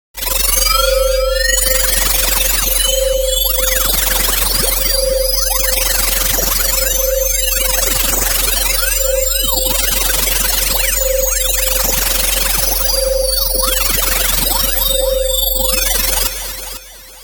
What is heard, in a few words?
sound design frequency modulation ascend delayed